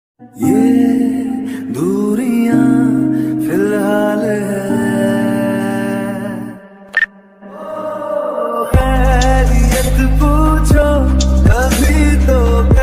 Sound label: ringtone bgm instrumental music; sushant singh